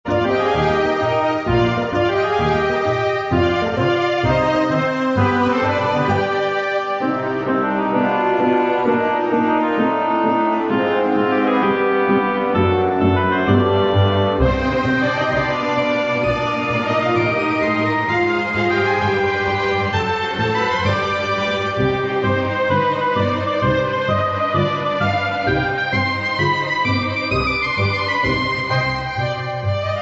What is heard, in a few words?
Orchestral Tarantella